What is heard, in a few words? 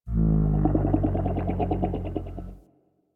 Venus Landscape Mushroom Creak; Ambience; Warframe; Venus Open World